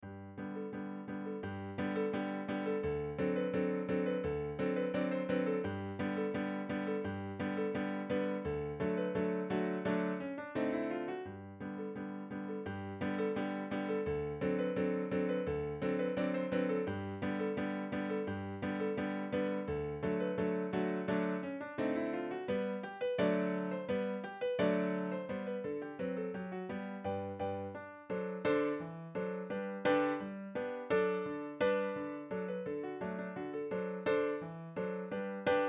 Pianoforte; Alexandermarsch; Ludwig van Beethoven

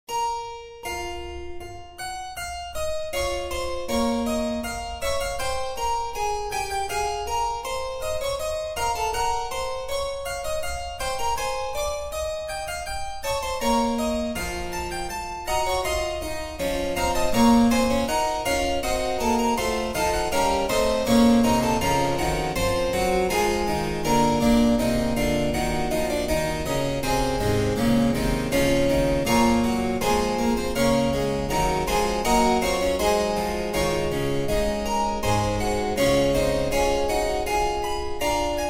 Fuga Si Bemolle Minore; J S Bach; Ludwig van Beethoven

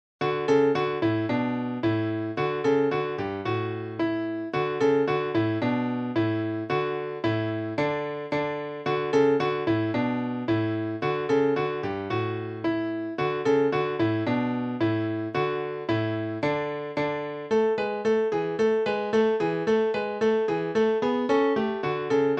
Esercizio Per Pianoforte In Re Maggiore; Kafka; Ludwig van Beethoven

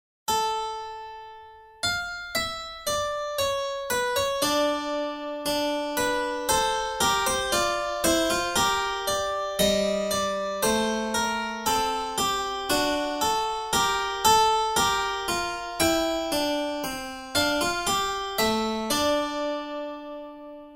Fuga Alla Quinta; Harmonie Beethoven

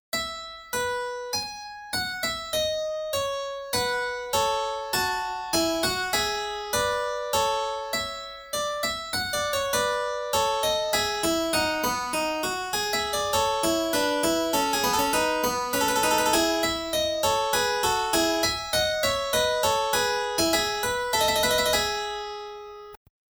Fuga Alla Seconda; Harmonie Beethoven

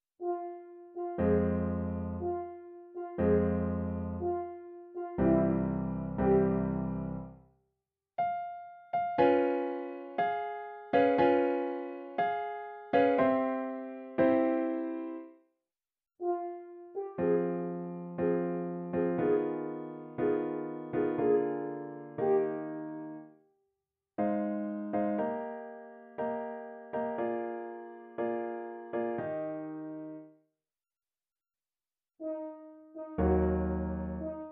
Sonata Per Corno E Pianoforte; Deuxième mouvement; Ludwig van Beethoven

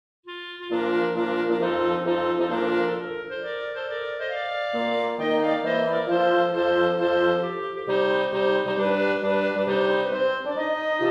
Marcia Per 2 Clarinetti 2 Corni 2 Fagotti; Ludwig van Beethoven

